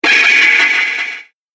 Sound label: Robot Chicken, robot sound effect; Crazy Fx - Robot Chicken; Sci-Fi Sound Effect